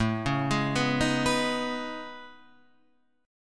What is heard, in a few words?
A Major Sharp 11th; AM#11 Chord {5 4 6 4 4 7} arpeggiated